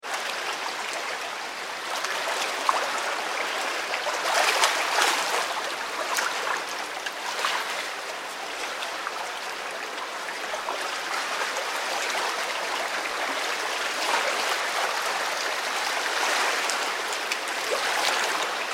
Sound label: Ocean Sea Light Wash, Close moving water; Nature; Tom Hutchings